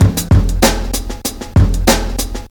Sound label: 128 bpm hq drum groove g key, Free drum groove - kick tuned to the G note. Loudest frequency: 1983Hz; drum loop